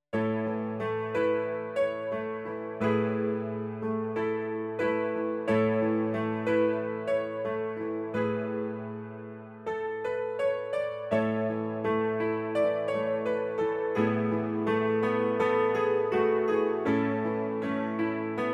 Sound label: workstation epiano; kurzweil k2700